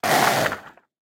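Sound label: mummy pain; En mymmy pain1; Fight Knight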